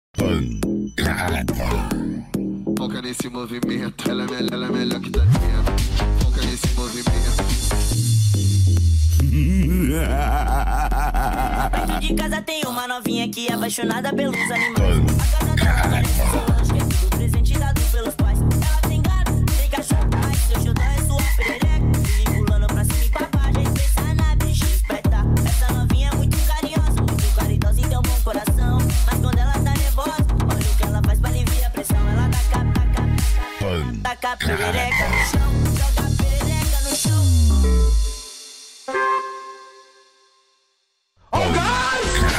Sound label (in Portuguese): mega funk funk